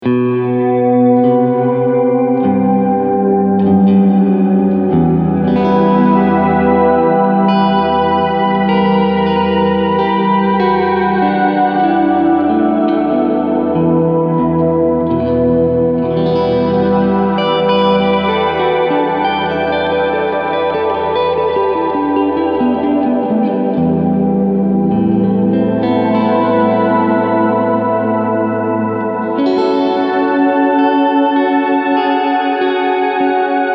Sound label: shimmer